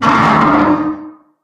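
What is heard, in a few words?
metal frame groan heavy; Source Engine; Portal 2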